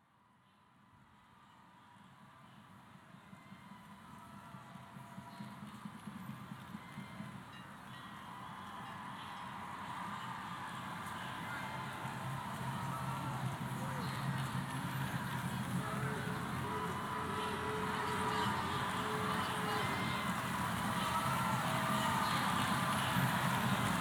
Ambience, field recordings of two peace demonstrations in NYC; Chant; Voice; Rhythm; PHILIP MANTIONE